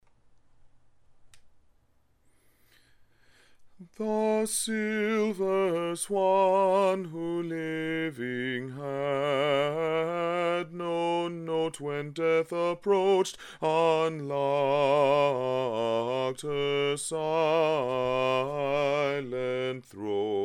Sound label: Swan Alto; The Silver Swan; Alto 1